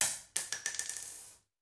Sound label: Bullet Shell; Sound Ideas; Guns